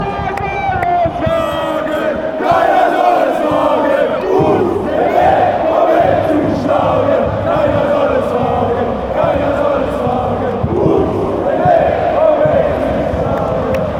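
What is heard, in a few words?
borussia dortmund chant, football songs and soccer chants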